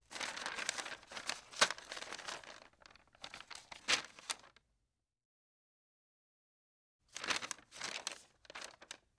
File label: Parchment Paper Hand